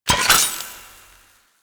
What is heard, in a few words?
Flame Spear Reload; Grineer